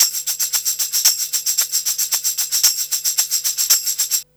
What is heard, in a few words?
PERC L, Roots Disco; USB Soundscan vol.32; AKAI